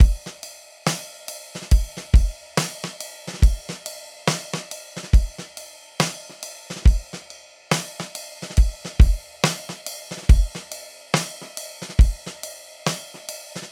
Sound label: 70 Acoustic Drums, Live Trip Hop Drums; Loops; Smoke Signal